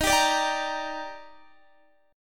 Eb Major 7th, Guitar; x,18,19,19,19,18; 4F,4A,5C,5G,5C,6E; strummed

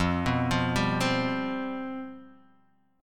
G 11th F

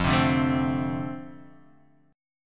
F 6th F, chord; F6 Chord {1 3 0 2 1 X} strummed

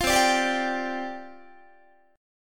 C Suspended 2nd Suspended 4th, down, Guitar; Csus2sus4 strummed

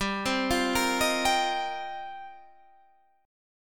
F 7th Suspended 2nd Suspended 4th

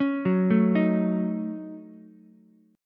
Eb Minor 7th Flat 5th, down, Ukulele; arpeggiated; chord; JGuitar

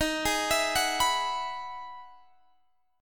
E Major 9th Eb, chord

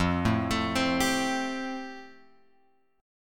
F Major Add 9th; Fadd9 Chord {1 0 X 2 1 3} arpeggiated

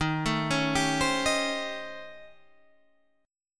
A 7th Sharp 9th Flat 5th

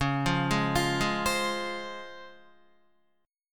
C sharp Major 7th, Guitar; arpeggiated; chord; 8,8,8,10,8,8

